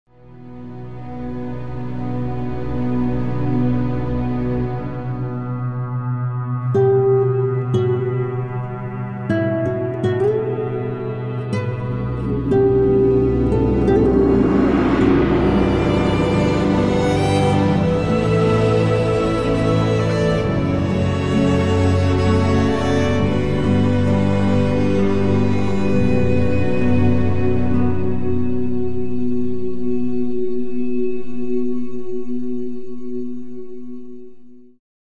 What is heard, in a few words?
Guitar Violin